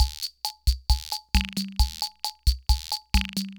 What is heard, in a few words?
Loop, sr-95; classic machines